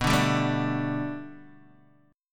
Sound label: B Minor 7th Sharp 5th B Guitar DADGAD, chord